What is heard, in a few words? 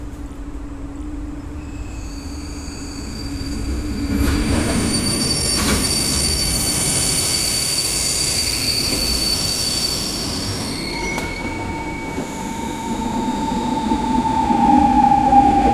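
electric train approaching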